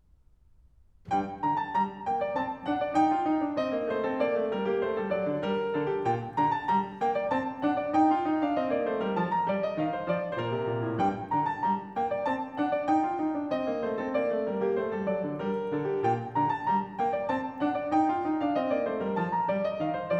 English Suite for keyboard No. 3 in G minor Gavotte; BWV 808 (BC L15); Gavotte 1 - Gavotte 2; Bach for Babies; Fun and Games for Budding Brains